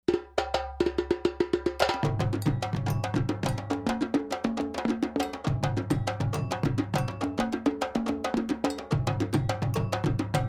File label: Djembe Ensemble